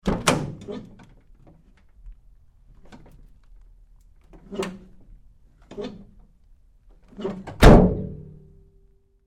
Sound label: Truck GMC Savana Door Side Open-Close Hard; 2010 GMC Savana; Trucks